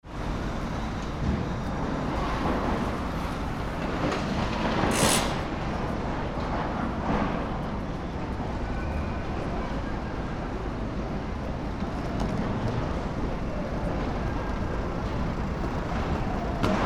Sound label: nakago demolition, Fukushima